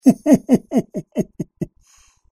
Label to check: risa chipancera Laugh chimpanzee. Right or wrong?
Right